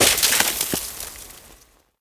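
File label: movement pick ice snow debris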